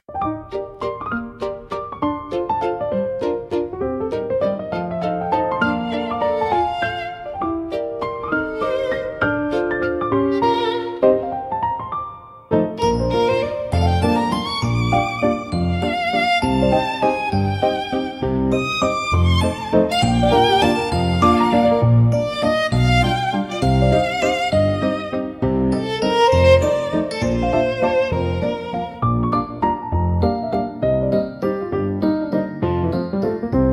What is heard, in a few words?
instrumental music